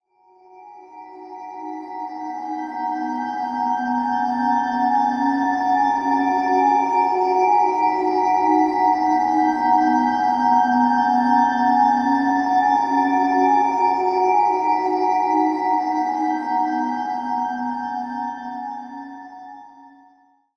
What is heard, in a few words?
Pad F#; One-Shots; Pads; Ghosthack; Color Bass Essentials